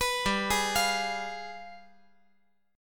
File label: G 7th Flat 9th